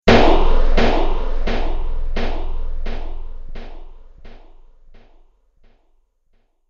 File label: Slow Motion Delay Space Impact